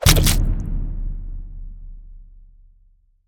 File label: Killing; Among Us